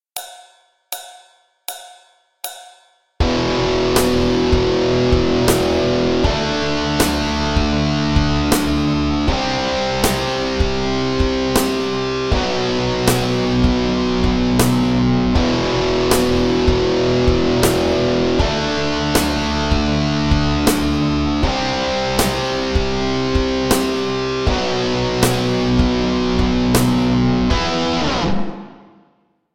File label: Backing Track Alternate Picking; The backing track to play along with; Lesson 6 - Alternate Picking - The Basics